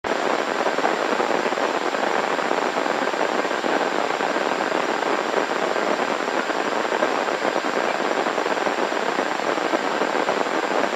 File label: Static Spitting, static